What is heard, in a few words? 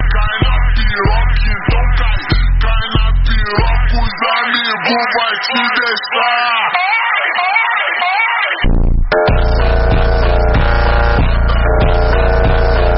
phonk